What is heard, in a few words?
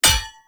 Hammer Impact Parry; Weapon Impacts; Player; Combat; Tuvalkane Hammer